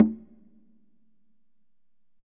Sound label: Congas wet, drums percussion